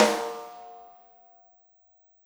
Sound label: Snare Shard; ZeroGravity Drum Shards